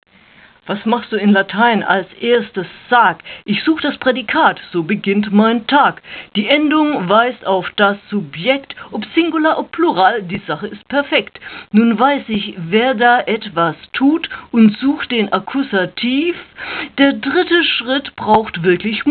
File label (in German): Latein rap